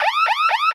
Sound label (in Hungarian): tigris riaszto; the wild thornberrys movie windows gamerip 2002